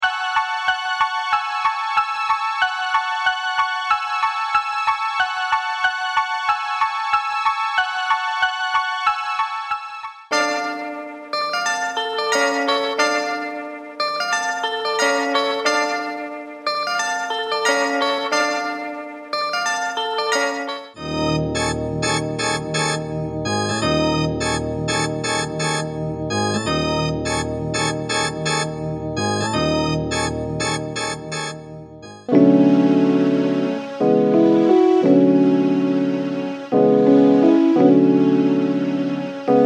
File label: keys